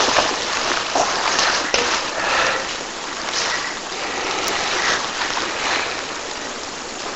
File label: Possible something being dragged; Erebus